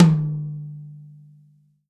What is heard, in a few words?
tom, Real Drum Kit, funk kit